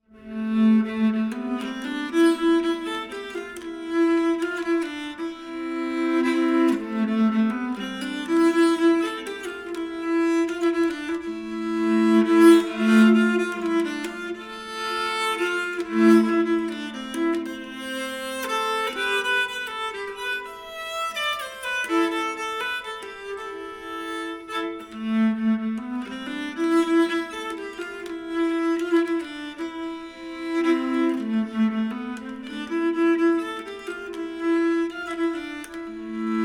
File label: Waltz Nyckelharpa; NRB Waltz